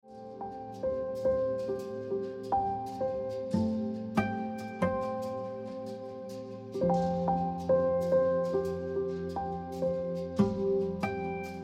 Ringtone

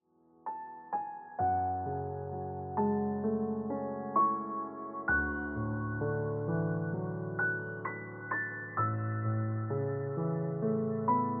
Ringtone Piano Music

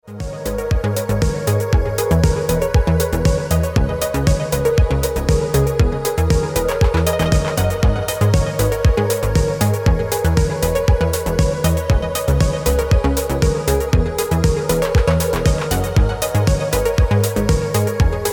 Ringtone

